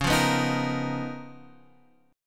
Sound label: C sharp 13th C sharp